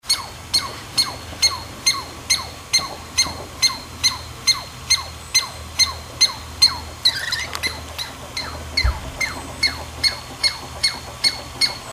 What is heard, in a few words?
Tamiasciurus douglasii